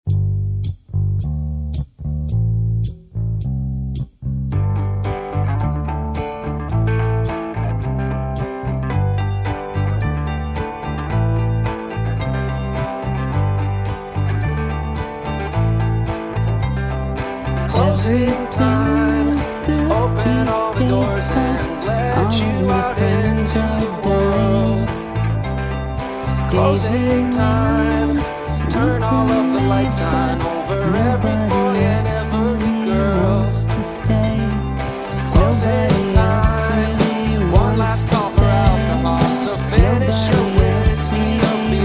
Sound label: open the door incomplete